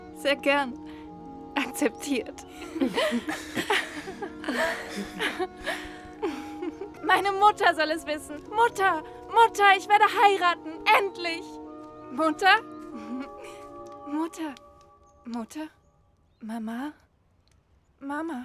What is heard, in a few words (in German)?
Jubel Weinen